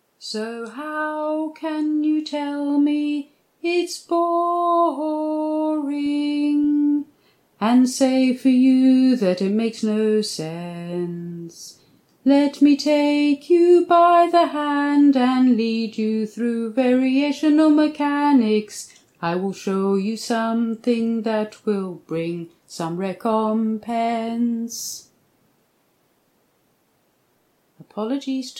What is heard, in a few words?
pola song